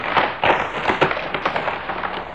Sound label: wood